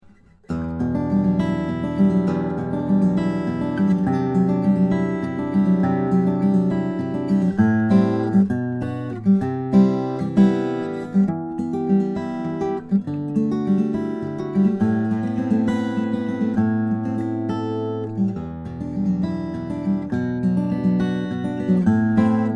bamburg sj double top, steel-string flattop guitar